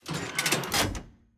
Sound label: Turret